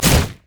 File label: seed step on; Zyra; LoL